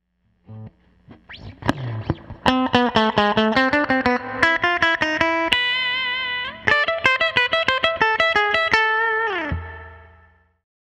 hum blade bridge

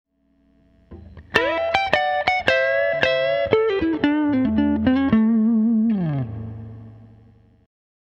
hum blade neck

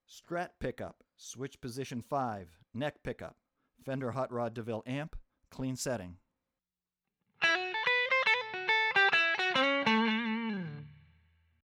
strat neck hotrod clean, guitars

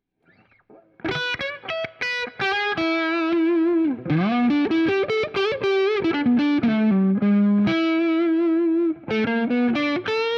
H540 Lowgain, guitar

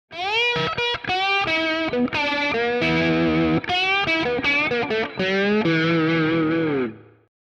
P90 soap slide

